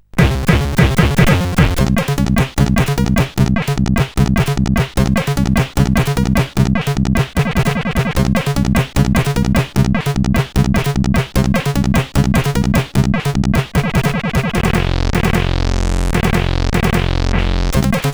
Loading 8 sid CSG8580; hvsc

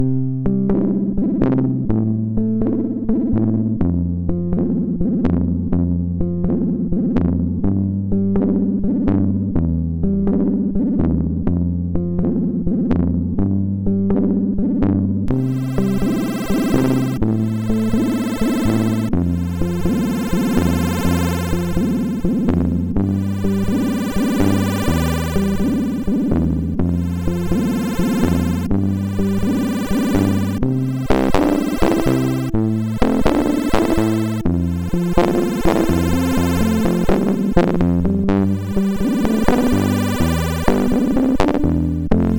Guitar by the Fire, sid MOS6581R4

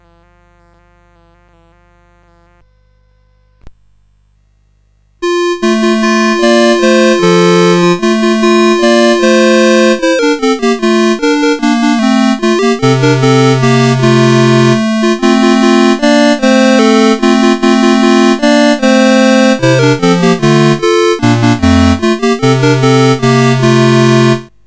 CSG 8580 R5

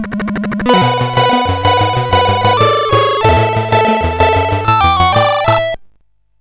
sid CSG8580R5, GAMES; Continental Circus

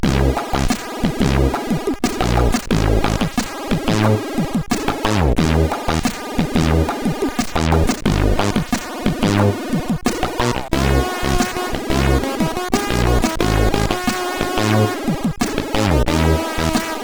Pod Jams, sid CSG8580R5

